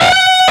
bend full Left-hand vibrato; osu

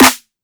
snare clap

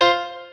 piano

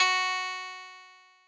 Koto F#3

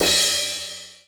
drum hit finish

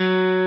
Arp.

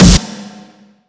impact snare mix

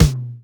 drum hit clap

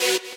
saw

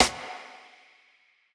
snare long

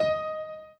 Piano Chord

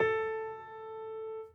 piano sus

